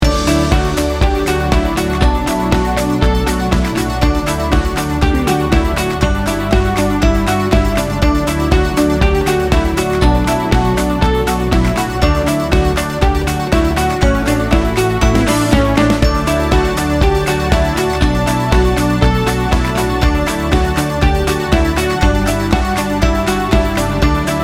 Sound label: happy glow upbeat positive